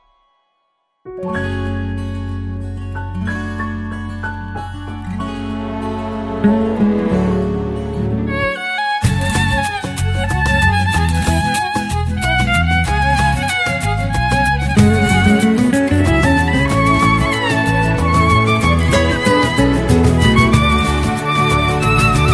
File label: Romance